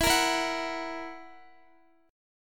E Suspended 2nd Flat 5th E down Guitar; Esus2b5; strummed

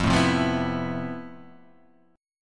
F Major Sharp 11th; strummed; JGuitar

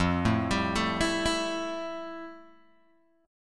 F Major 9th F, Guitar, Open G

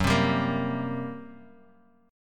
G Minor Major 7th Double Flat 5th, F sharp

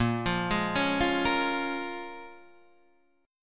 A sharp Minor 7th, down, Guitar, Drop D

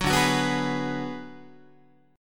D 6th Flat 5th D down Guitar, chord; D6b5 strummed; JGuitar

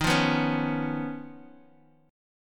Eb 7th Suspended 2nd Sharp 5th Eb, Bass Standard; Eb7sus2#5 strummed; JGuitar

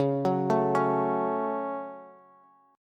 Db Suspended 4th Sharp 5th, Bass; 21,21,19,18; arpeggiated

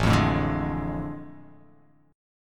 A Major 7th Suspended 4th Sharp 5th

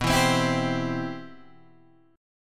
C Major B

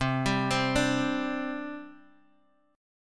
C Suspended 2nd C Guitar Open D; down; chord shape

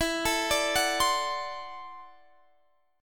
D 7th E, guitar; D7/E; arpeggiated

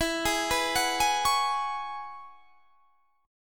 E 6th Add 9th E down; E6add9; arpeggiated

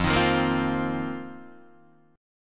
F 7th Suspended 4th F, Guitar, DADGAD

